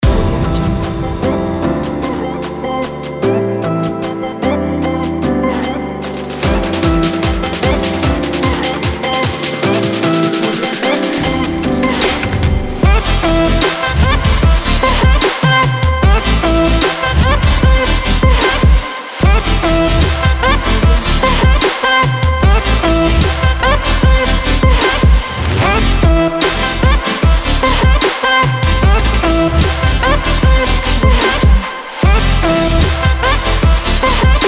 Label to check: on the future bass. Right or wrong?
Right